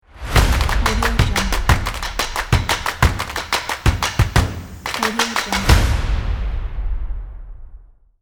energy logo

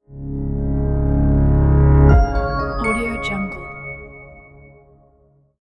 modern logo

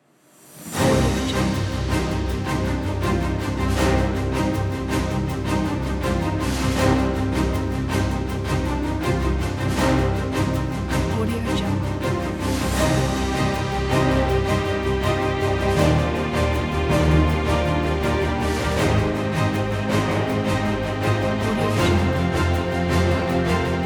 dramatic orchestral

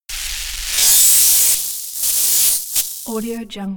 static loud; mr pink mr white; soundmorph

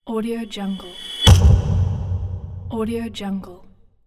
scare build up

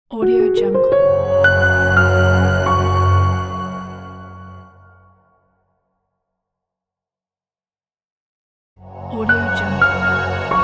piano logo; happyjungle

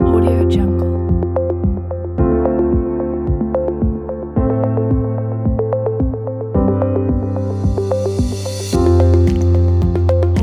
science ambient

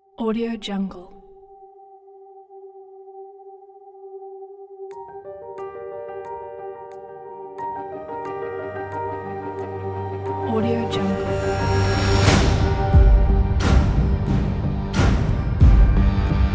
dream victorious

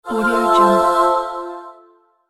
magic angel musical accent

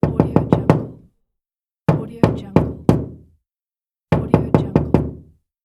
wood door knock; blackdragonfx

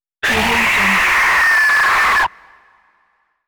alien screech scream; hollywoodedge

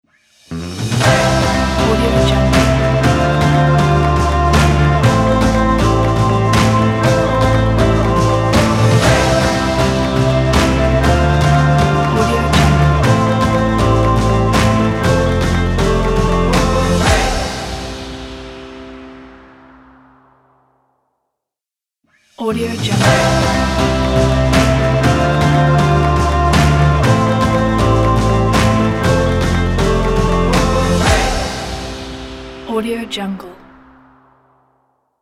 indie pop rock music logo

